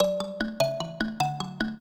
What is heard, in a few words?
trio, mbira; Mozart